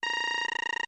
Computer Working, beeps & tones

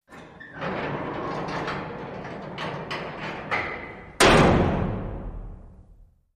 Cell Door Close Metal

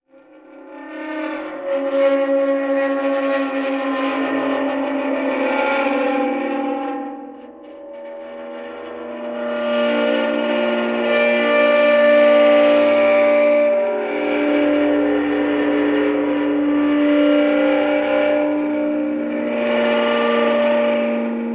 Dry Ice Eerie Slow, dry ice

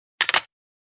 Futzed Phone Receive, hang up

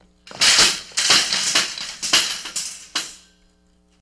Shopping Cart Thin Me

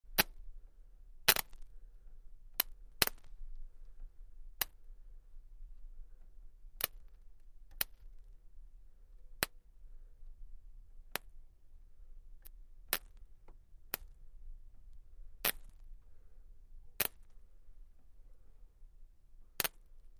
Car Battery Sparks; Close Perspective

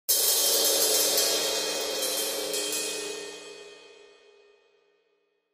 Cymbal Trill

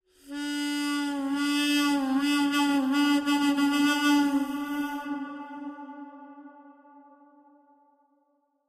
Mouth Organ, harmonica; woodwinds

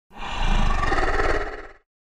Monster Breath CU, creatures

